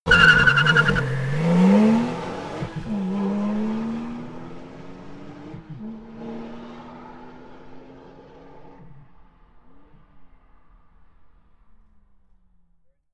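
sports car exterior porsche gt2 drive accelerate fast; verkehr-transport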